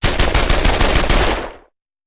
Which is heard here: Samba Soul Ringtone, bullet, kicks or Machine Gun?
Machine Gun